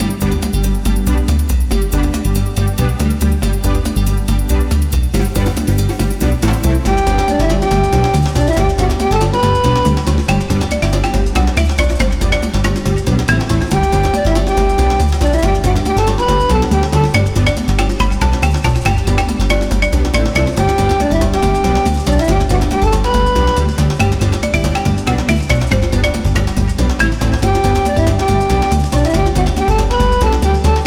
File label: joyful jungle bpm140